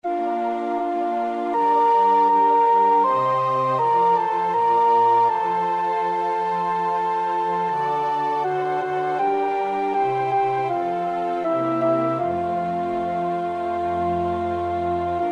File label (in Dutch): alt, koorpartij oefening; Schubert (Franz); Deutsche Messe (D872)